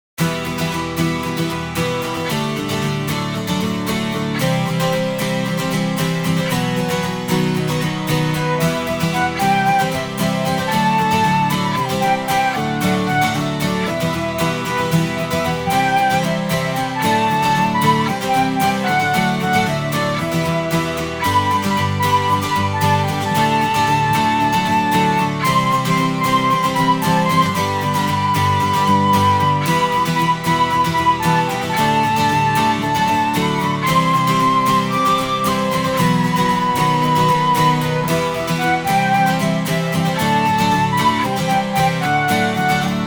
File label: INSTR